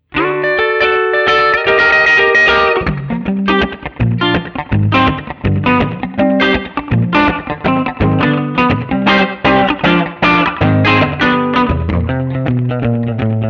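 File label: Rockabilly End Middle, guitar; Guild Starfire III; 1966 Guild Starfire III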